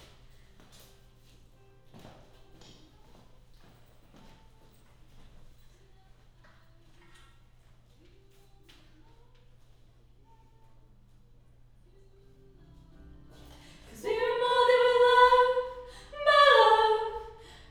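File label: Choir Harmony High L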